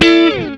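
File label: GUITAR CHORD DOWN; E-MU Producer Series Vol. 8 – Platinum Phatt; Gtr Chords&Licks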